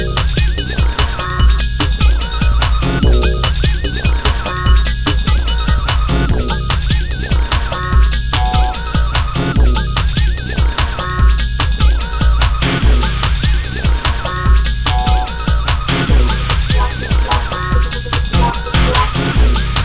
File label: Instrumental remix